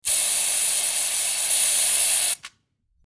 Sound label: Graffiti spray can